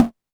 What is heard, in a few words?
polyvox rimshot, Polivoks; Formanta Radio Plant